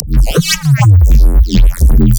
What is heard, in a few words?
Neuro Bass